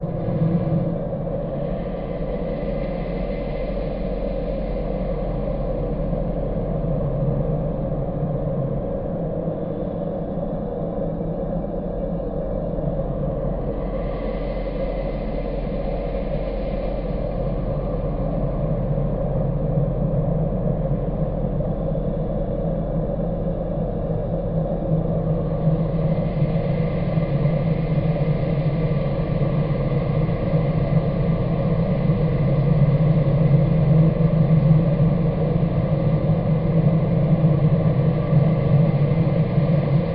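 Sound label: Breath Distant Low Rumbling; air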